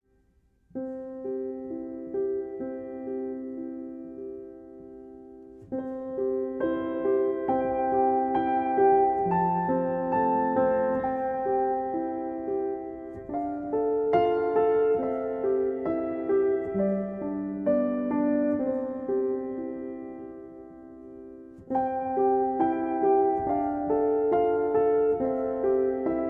Twinkle Twinkle Little Star, Relaxing Piano Lullabies